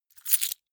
key ring large grab hard

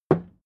hammer wood

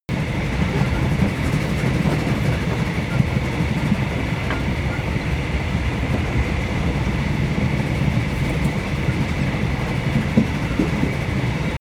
train wagon interior drive fast loop

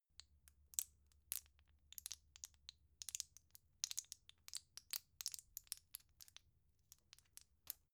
plastic blister handle click

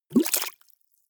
bubbles hard

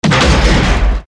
fat metallic impact

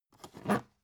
dvd case pick up

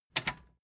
metal button tiny click disable fast

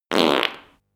fart deep

